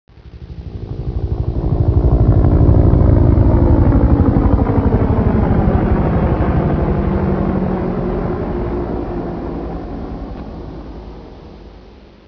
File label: chopper; aim skytex